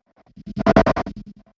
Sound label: Strobe, transition